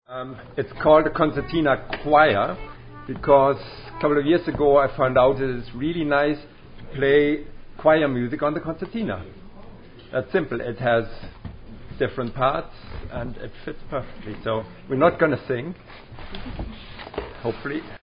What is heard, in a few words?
Concertina Choir Madrigal Intro; 2009 Concert; Morley; Now Is The Month Of Maying